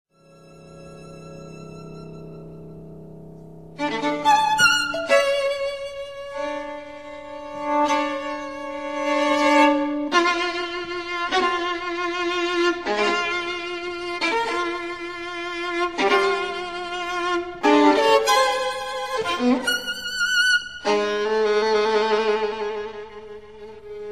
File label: Trio for violin, cello & piano